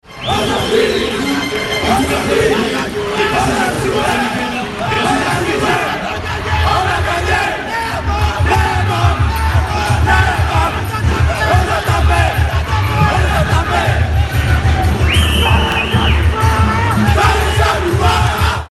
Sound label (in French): cri de guerre; St-Denis